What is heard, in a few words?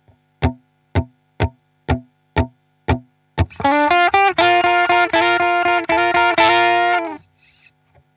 blues, guitar